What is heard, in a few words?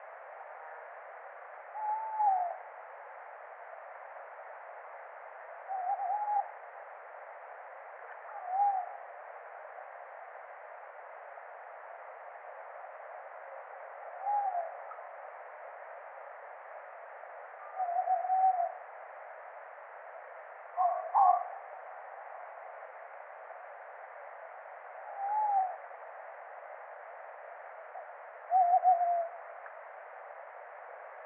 call, tawny owl, Strix aluco; The recording was made with an unattended automatic apparatus.